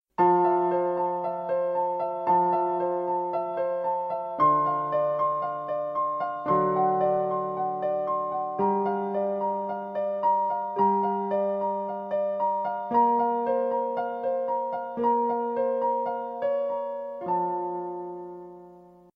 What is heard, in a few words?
pianist